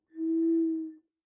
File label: Bottle Blown